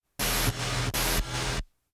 tubular synth blasts short buzzes suction

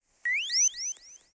electronic beeps alien birds chirps

